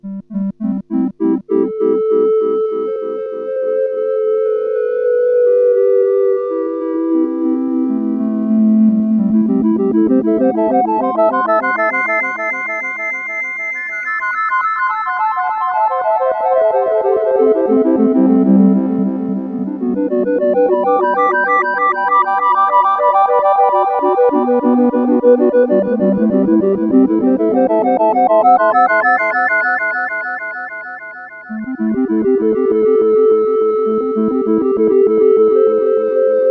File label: Tuning